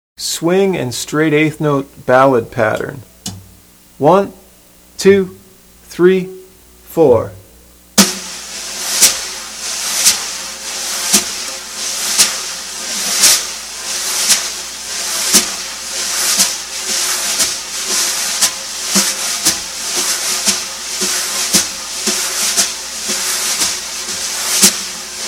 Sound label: swing and straight 8th note ballad pattern